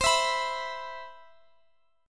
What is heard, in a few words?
C Major 7th, Guitar Open D